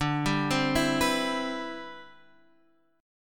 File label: C Major 7th, D, guitar chord; Cmaj7/D arpeggiated; JGuitar